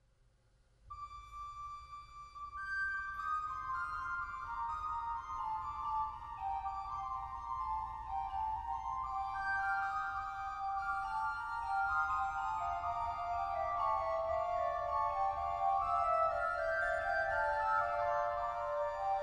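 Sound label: orgue; Dietrich Buxtehude; Canzona in g; BuxWV 173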